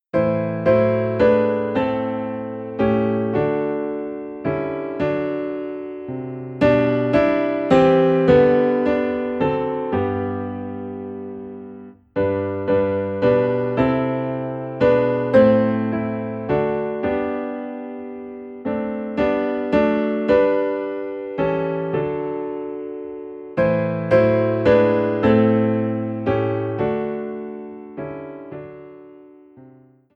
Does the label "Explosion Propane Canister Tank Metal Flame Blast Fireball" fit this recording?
No